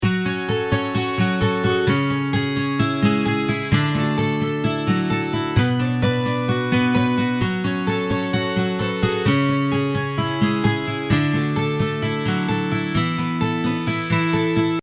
sweet guitar alarm ringtone

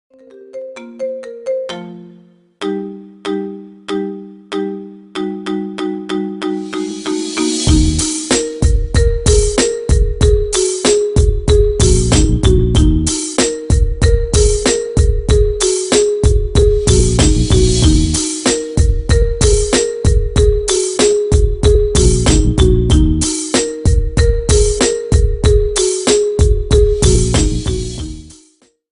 marimba remix

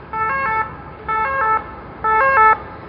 barcelona ambulance ringtone

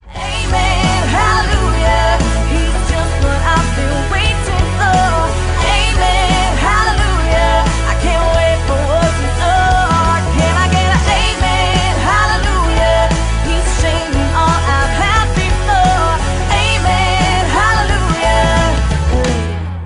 amen hallelujah ringtone

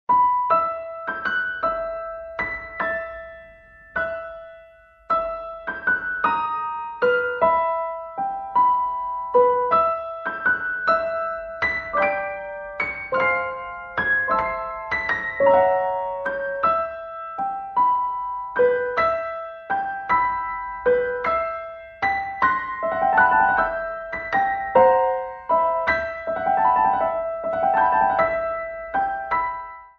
piano ringtone; harry potter

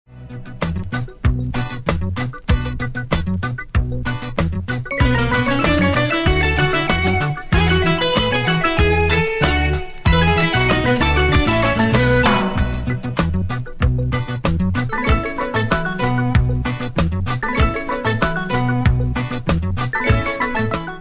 afro ringtone

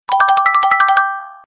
iphone message tone ringtone

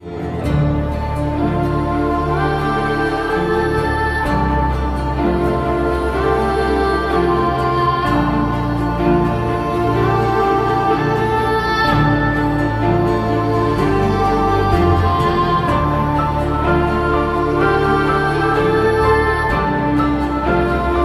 ringtone; assassins creed 2

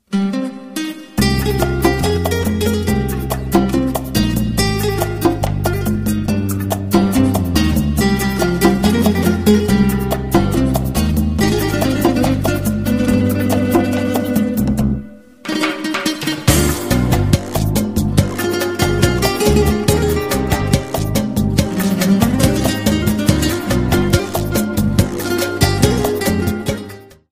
spanish guitar ringtone

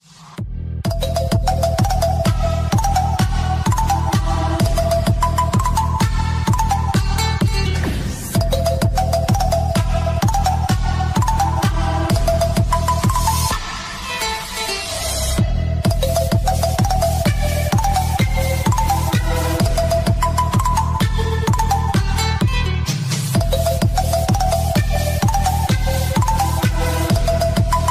trance ringtone

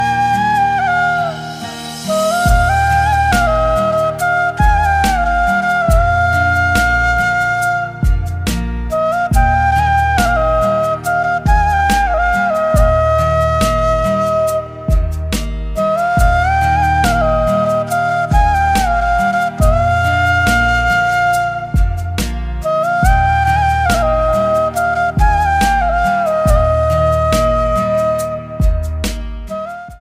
flute ringtone

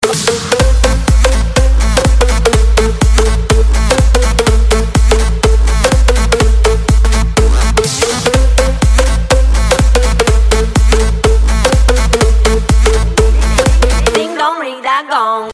gong ringtone

